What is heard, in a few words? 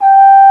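French Horn p G4; Digital Sound Factory Virtuoso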